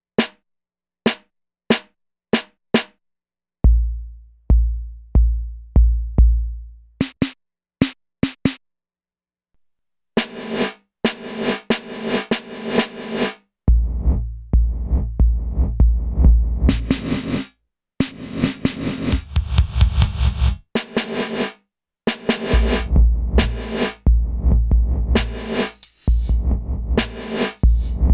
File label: BACKWARDS REVERB